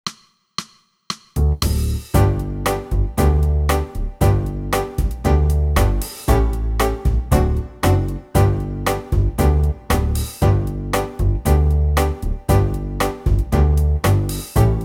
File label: Rhythm Session; red hot recorder tutor